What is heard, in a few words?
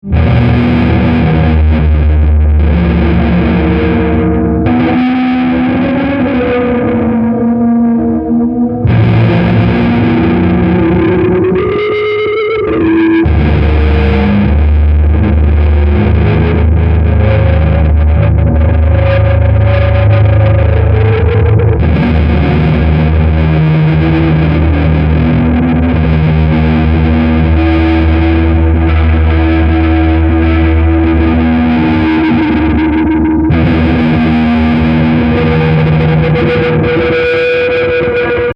extreme wash; deathbyaudio